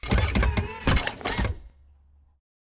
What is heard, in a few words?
alert idle to walk; Titanfall 2